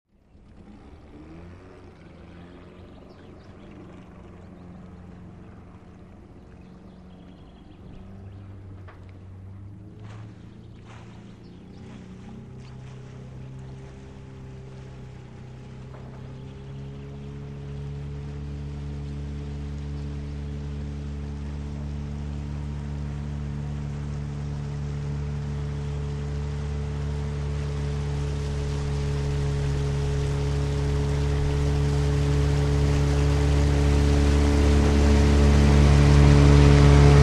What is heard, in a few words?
Boat with Engine; By / In; Boat In And Away With Water Lap, Turns In Mid Shot And Then Comes Back Towards Mic.; powerboat